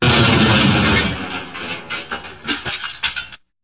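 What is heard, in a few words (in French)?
Casser voiture a la casse, Accidents voitures; Accidents voitrues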